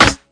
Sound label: snare